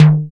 FX Analog; Sound & Vision - Gigapack II

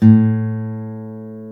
GTR A2, Dual Guitar, Ac 12+; Club-50 - Foundations

